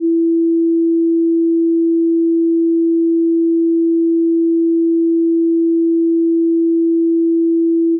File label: garage test